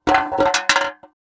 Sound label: Empty paint can setting down